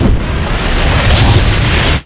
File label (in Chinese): 落地; 红色警戒2; 超级武器音效